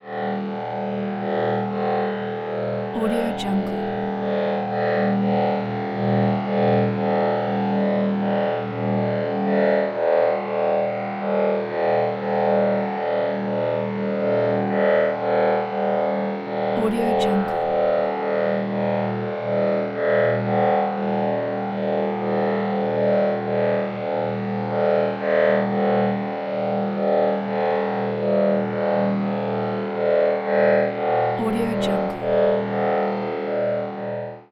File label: force field